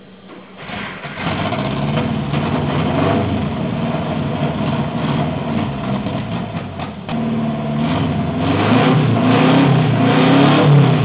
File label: vw motor